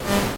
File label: boss beam; tf; danmaku fortress